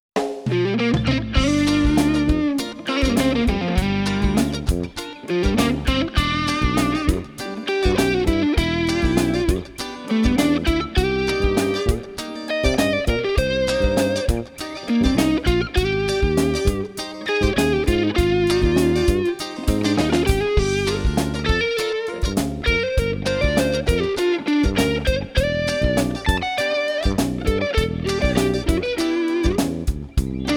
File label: gm7 em7 cm7 abmaj7; bergeron guy